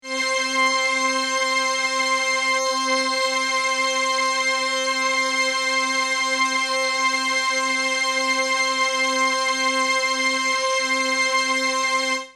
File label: carl martin chorus